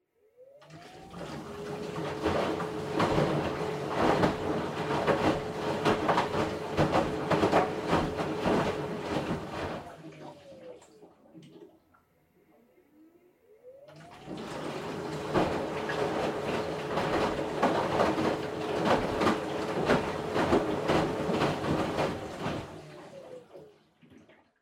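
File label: Washer Front Load Operation Noise Wash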